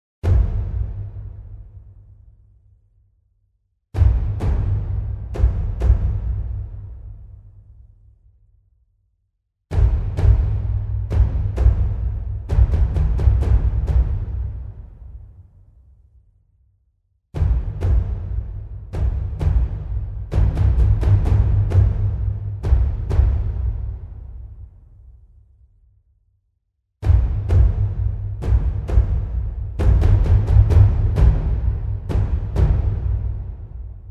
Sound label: groove; Kingdom Of Fantasy; Groove: Ork Drums